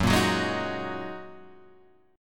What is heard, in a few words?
F sharp 6th Add 9th F sharp Guitar Standard; F#6add9 strummed